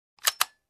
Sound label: Pistol reloading